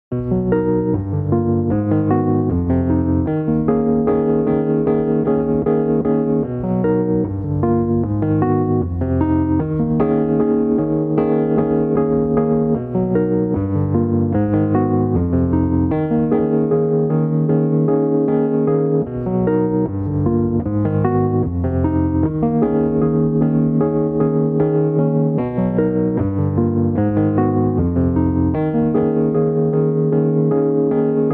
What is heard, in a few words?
chords